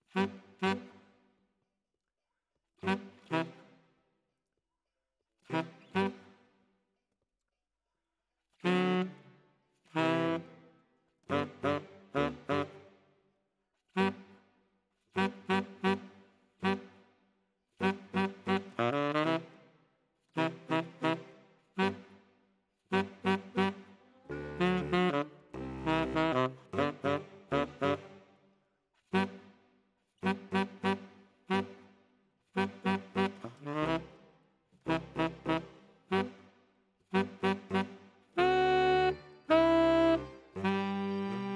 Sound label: Tenor, RNR for piano